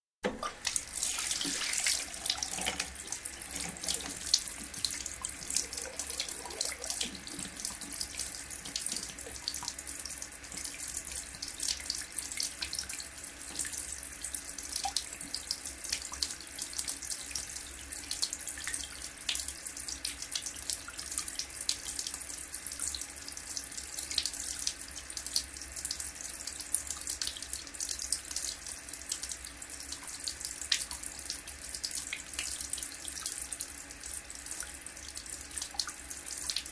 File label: Wash-hand basin, running tap with plug not inserted; Water Sound Effects; BBC